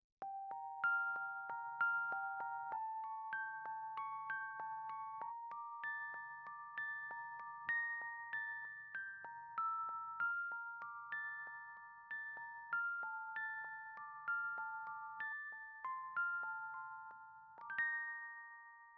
Rhodes

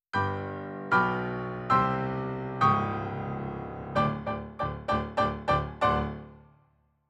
RnR Piano

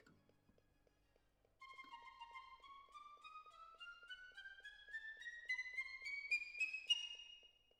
Cello Solo

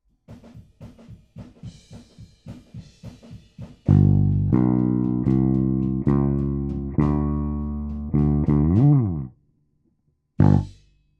Bass

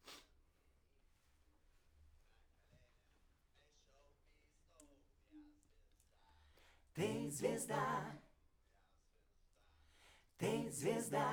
Backs SCHOEPS

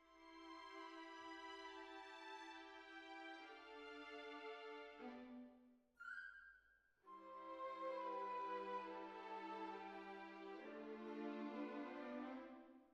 Cbasses

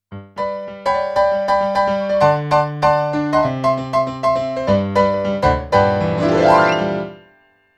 PIANO, RNR for piano

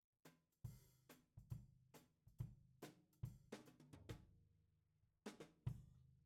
Tom

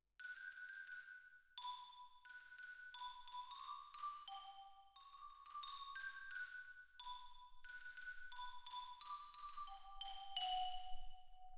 Xylo

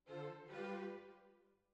Oboe

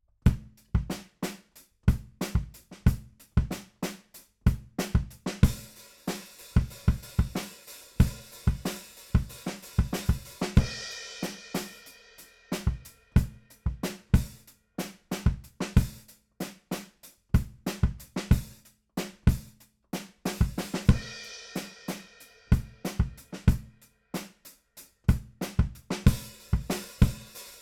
BD Out

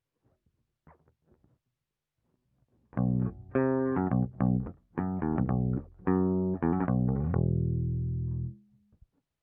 Bass DI

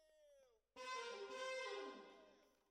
Alto, RNR for piano

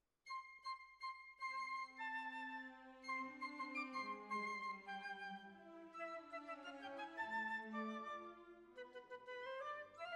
Clarinet

